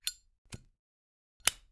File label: lighter; AKAI S6000 CD-ROM - Volume 6; Tools